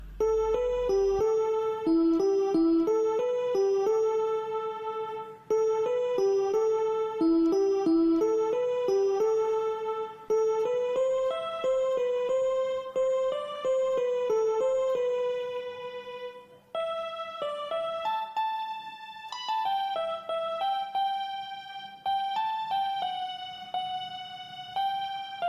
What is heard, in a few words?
instrumental ringtone